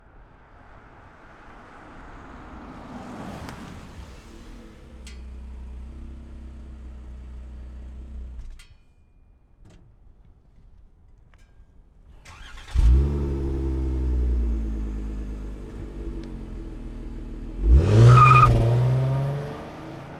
Chrysler TC exterior startup aways passbys approaches stops shutdowns various speeds; Chrysler Town & Country 3.6L VVT; shotgun; Pole Position